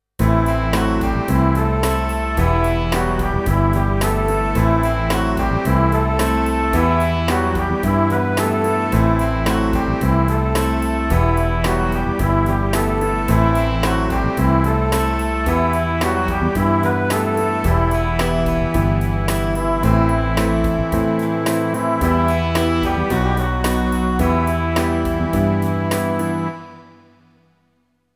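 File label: Em Riff big ballad waldhörner, clean guitar 110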